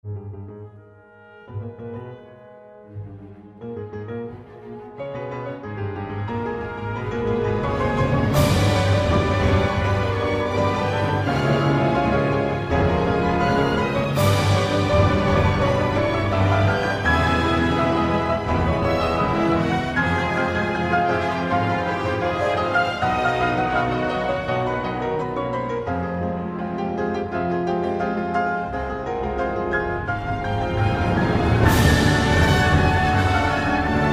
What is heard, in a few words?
Piano Con